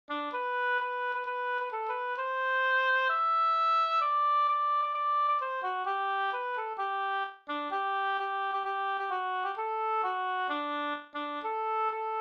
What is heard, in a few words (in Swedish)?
folkdans, Jagarmarsch Gdur